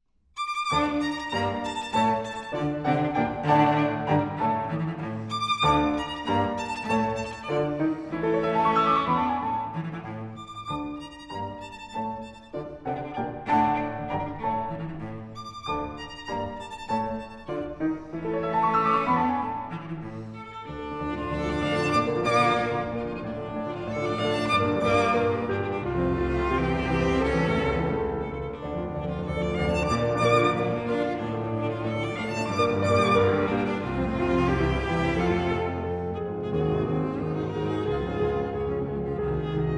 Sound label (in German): Klavier Trio